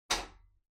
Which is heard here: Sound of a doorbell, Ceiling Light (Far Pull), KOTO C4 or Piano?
Ceiling Light (Far Pull)